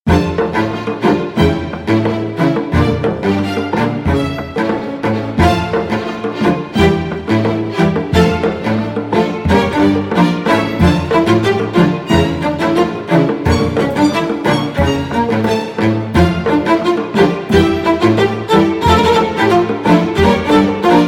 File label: staccato and pizzicato